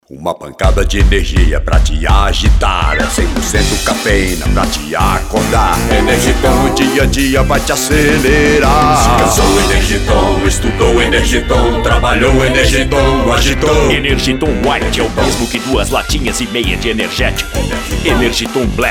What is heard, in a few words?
Jingle; Energiton